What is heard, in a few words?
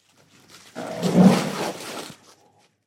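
sounds of rhinoceros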